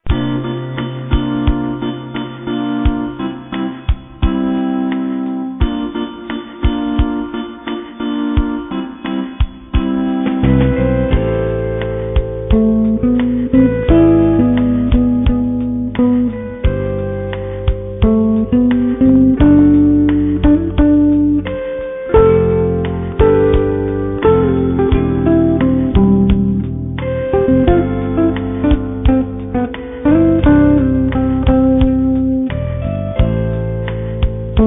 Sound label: blues